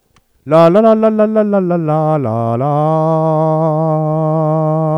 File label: aaaaah